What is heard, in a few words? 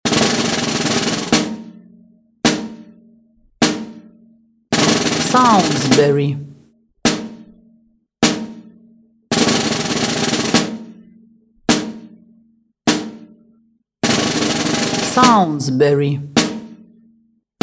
Funeral March Dignity Solemnity